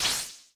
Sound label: Flick